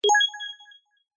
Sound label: button